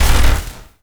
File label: FX Hit; Drums & Drones